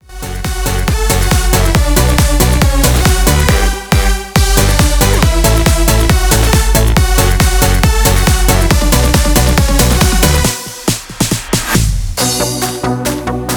Dance

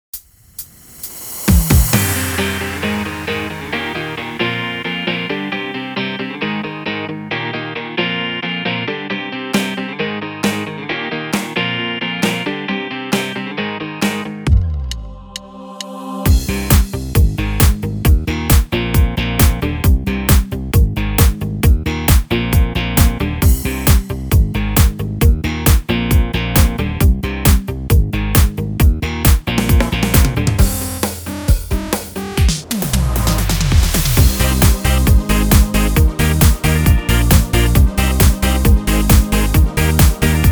Bongo

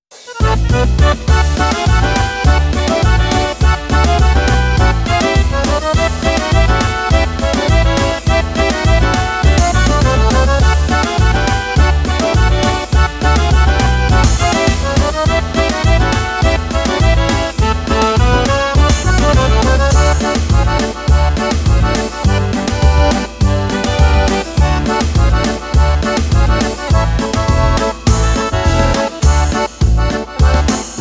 Folk Party

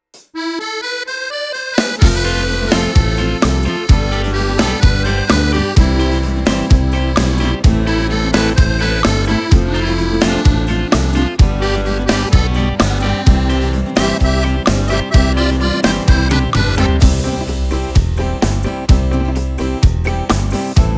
Ballad

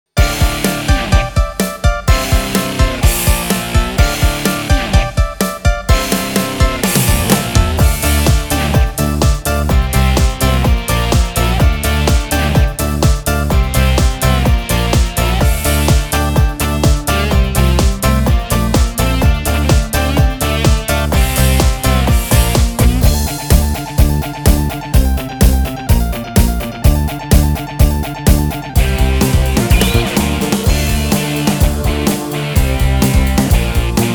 Disco

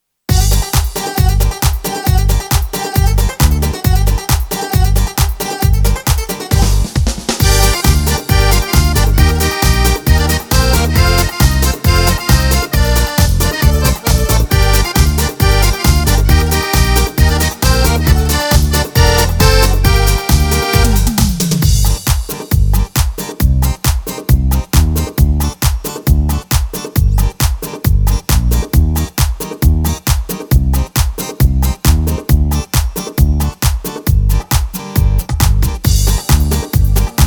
Pop Dance

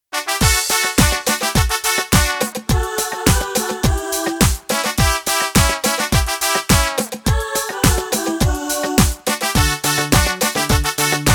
Disco

